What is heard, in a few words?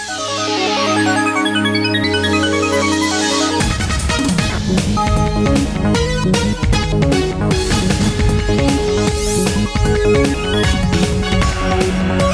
Jazz Intro